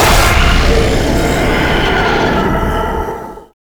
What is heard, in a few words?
Puzzle Button; Warframe; Duviri Murmur; Medusa Puzzle